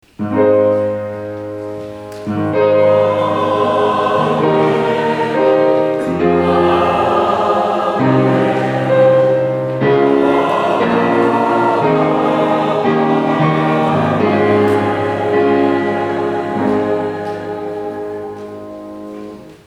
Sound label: Amen